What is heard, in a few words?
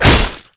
blade slice; tf